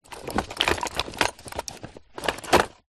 leisure toys wooden and plastic movement rummage inside kids bag